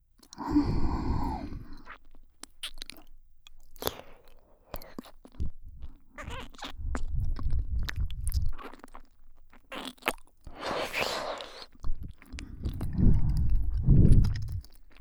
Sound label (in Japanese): 入り口ぺろぺろ 耳舐めループトラック, ASMR; あさ～い入り口耳舐め; エロ舌JKアスカちゃん; 学園耳舐め裏リフレ